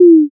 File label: m message